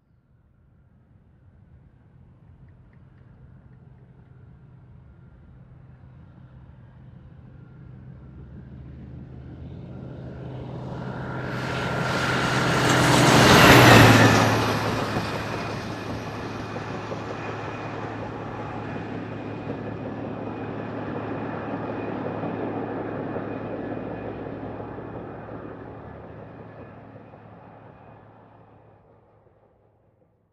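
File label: sound of a passing truck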